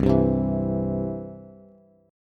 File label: C Major C down Bass; strummed; JGuitar